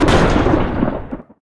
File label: missile hit